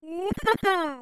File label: achievement strong; VIVAE; vocaltrax